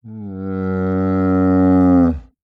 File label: Animal Mammal Herbivore Camel Groan; animals; AdobeCC